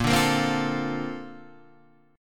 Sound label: A sharp Major 9th, Guitar Drop D